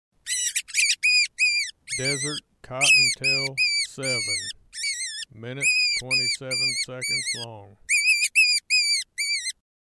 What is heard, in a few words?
DESERT COTTONTAIL